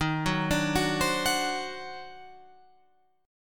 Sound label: Eb Minor Major 13th, Guitar, Open F; EbmM13; arpeggiated; JGuitar